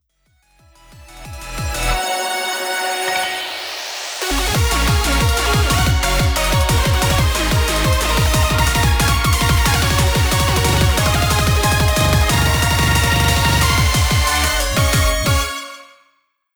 Outro

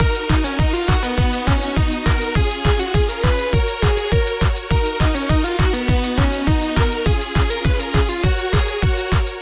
Lead Kick Hat Clap Super Saw

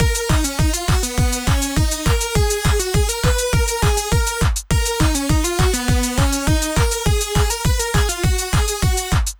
Lead Kick Hat Clap

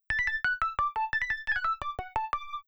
Plucky Plucky; シンセプラック; メインとは別のメロディを奏でます。; メロディの折り返し部分すこ～し聴こえる音ですね。

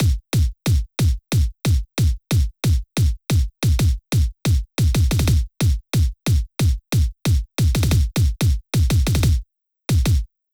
Kick, ドラムキック; EDM; とてもタイト短い音である必要があること; BPMが速い上に連打する前提; Hardcore Techno

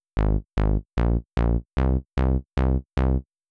A♭ B♭ C Bass

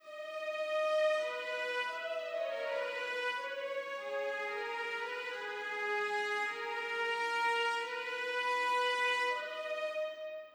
Chord Pad Viola; ヴィオラ; ストリングス

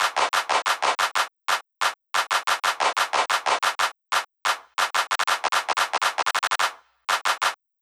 Intro Clap

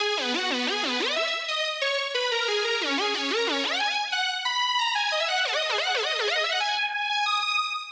Intro Lead; 下がる時だけでなく上がる時にもポルタメントが掛かってうねうねします。

